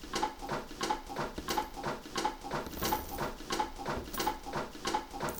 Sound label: Steam Machine